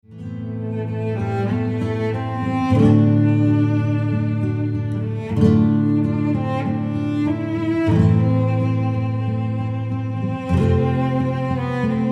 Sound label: ceremony, Flamenco, Spanish Guitar Duo